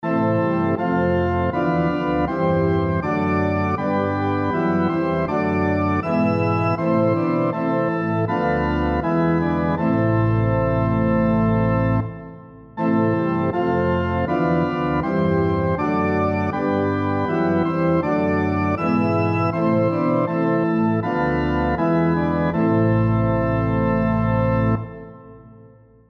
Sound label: SATB on Piano Example